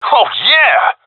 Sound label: cheer, seal diver; Source Model Packs; Counter Strike Model Pack; CS2